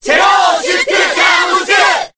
Cheer Korean; Zero Suit Samus; SSBB

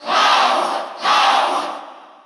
Cheer Russian; Cloud; SSBU

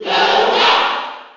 Cheer; Dr. Mario; SSB4

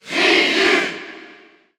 Cheer French; Ryu; SSB4

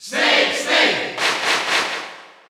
Cheer Dutch; Snake; SSBU

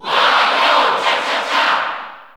Cheer Italian; Wario; SSB4 SSBU

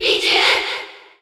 Cheer Japanese; Pichu; SSBU

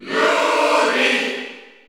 Cheer Spanish; SSBU

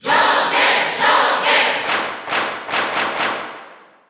Cheer Italian; Joker; SSBU

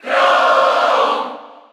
Cheer Spanish; Chrom; SSBU

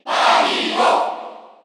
Cheer Korean; Mario; SSBU

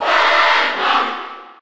Cheer Spanish; Pac-Man; SSB4

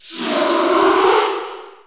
Cheer French; Shulk; SSBU

